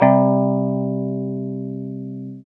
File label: guitar 5TH